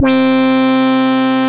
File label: Punchy Solo, Synth Lead; Bass; Ableton; Creative Extensions